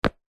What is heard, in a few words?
foley footstep single boys sneaker on concrete